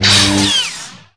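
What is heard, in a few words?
Light Saber ricochet, Contacts avec d'autres sabres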